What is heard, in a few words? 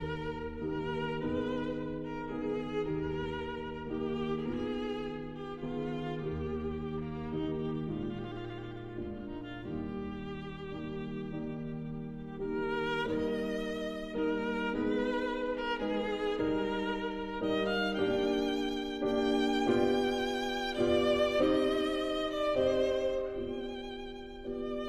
Sicilienne for violin and piano